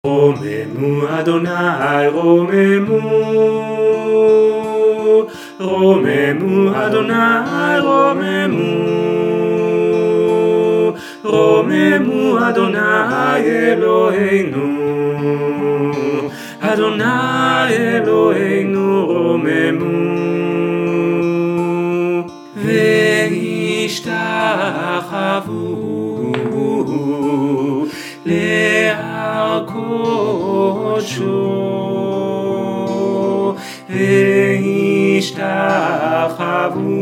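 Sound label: all vox, choir music; BCC choir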